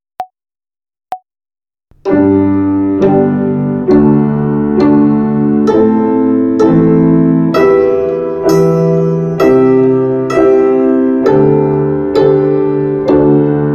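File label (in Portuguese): Ginástica do Pizzicato com cordas soltas Binária, Violino; Violino para os Pequeninos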